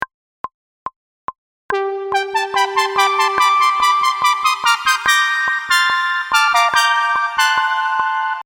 Lead

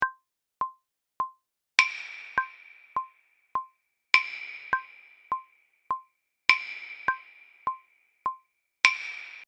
Perc

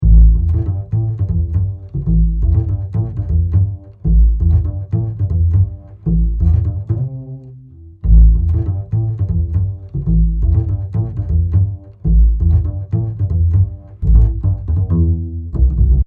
Bass A

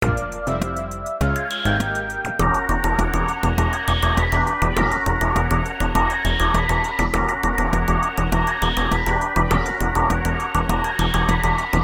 perc

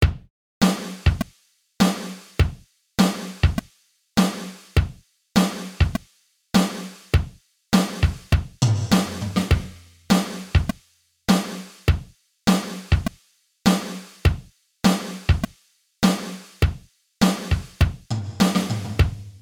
Drum Rev

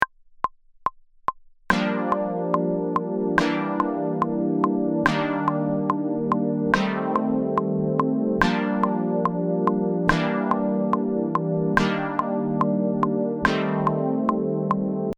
chord

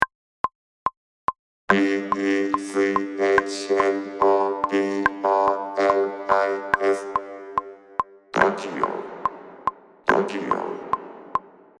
Vocorder Rev

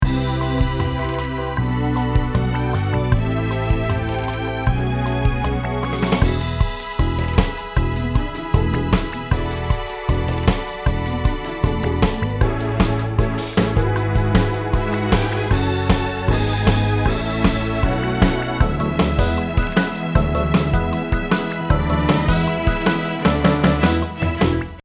Strings

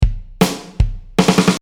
Drum No Velo

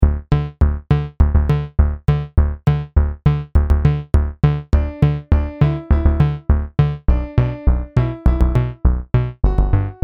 Bass

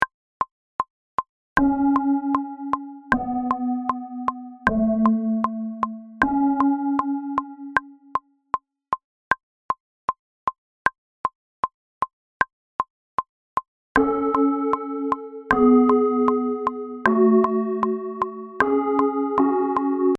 Synth

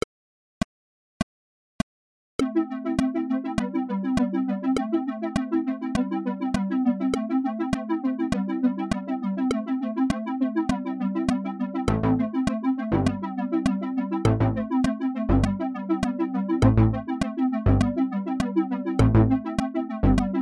SEQ BASS

